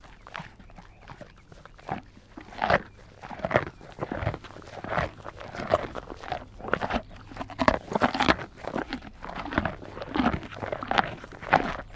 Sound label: Camel Chewing Carrot; camels